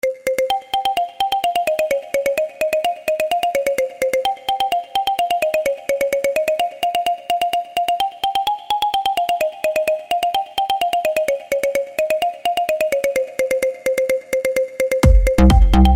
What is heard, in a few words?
Marimba